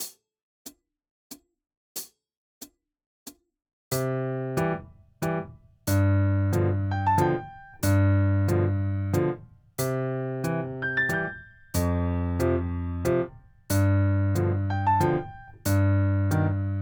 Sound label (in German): 4 Toene Begl.